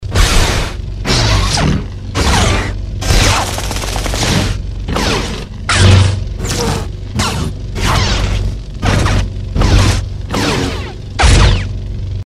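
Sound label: udary svetov mechom, lightsaber strikes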